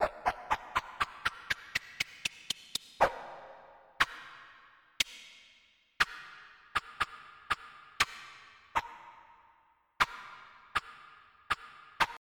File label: sword; Populous Demo